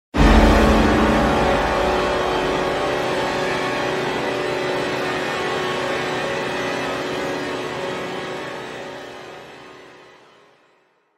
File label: skrimer muzyka